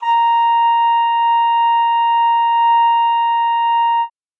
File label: trumpet a#5, Trumpet cup mute